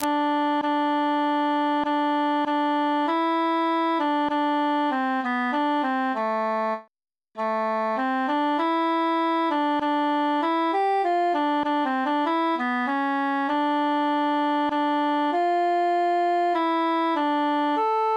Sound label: altus solo; deo gracias